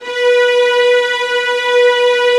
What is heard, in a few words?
VIOLINS C#, Synclavier Strings; ILIO